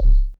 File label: bassy, Clavas, Misc Percussion